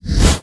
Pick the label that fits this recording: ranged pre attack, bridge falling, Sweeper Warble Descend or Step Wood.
ranged pre attack